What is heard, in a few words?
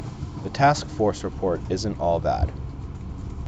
mixed bus